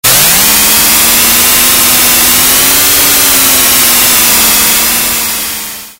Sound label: Chain Saw; Splatterhouse; arcade